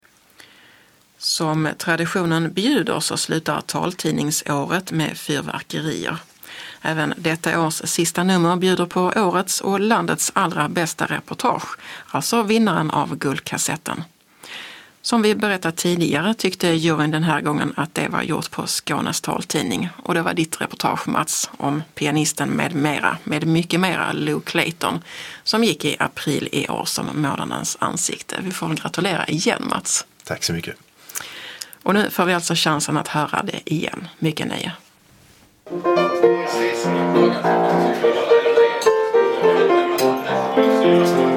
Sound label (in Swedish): pianisten